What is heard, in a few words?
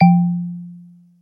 scifi plonk